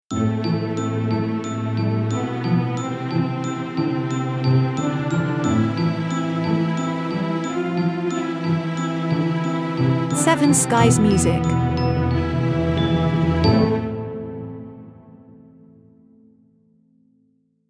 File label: Crescendo Stingout